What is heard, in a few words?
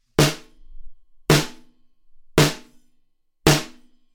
NT3 Snare Chandler EMI REDD47